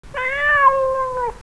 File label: CAT, 猫; 特酷声音素材库